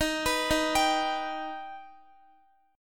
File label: Eb Augmented Eb, Guitar; arpeggiated; x,x,13,16,15,14